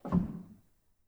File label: rel, Grand